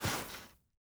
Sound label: snowball pickup; winter; Counter Strike Model Pack; CS:GO